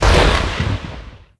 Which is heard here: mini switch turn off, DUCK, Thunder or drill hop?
Thunder